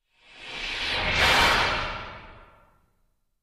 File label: Distant Steam Releas